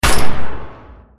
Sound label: Assault Rifle; FoS weapon sounds